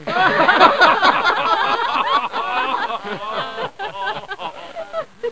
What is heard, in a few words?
Speech Laught